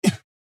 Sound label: AHurt